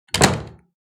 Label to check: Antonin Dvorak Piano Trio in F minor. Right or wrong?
Wrong